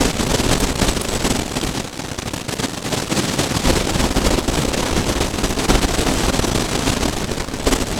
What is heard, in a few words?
FX Ambient; Drums & Drones